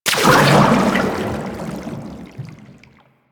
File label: Boost Thruster; Warframe; Sharkwing; Vehicles